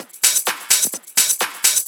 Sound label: Loop, 128 BPM; Vocal-Hook Kits